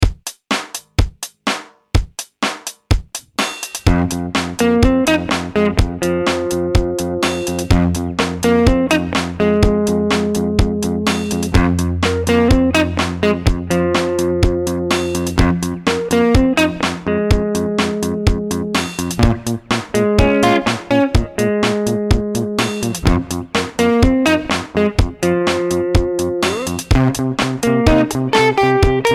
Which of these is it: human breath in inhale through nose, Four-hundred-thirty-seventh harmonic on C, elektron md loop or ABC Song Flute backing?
elektron md loop